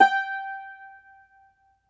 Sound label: cumbus saz G, plucked strings